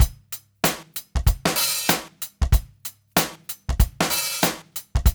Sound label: DRUMS